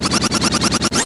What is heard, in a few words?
hover long; yoshi; N64; YOSHISISLAND